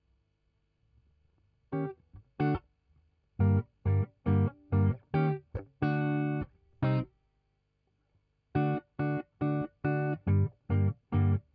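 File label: Guitar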